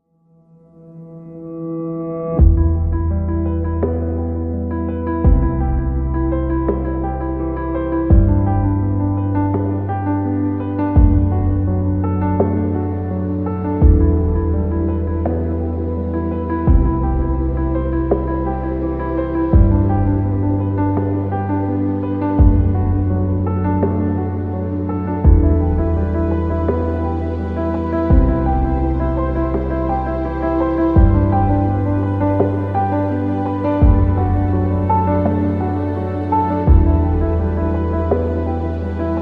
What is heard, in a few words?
Family drama; Bootflare